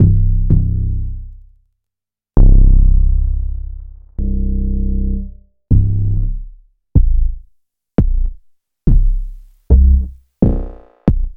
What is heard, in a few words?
Bass c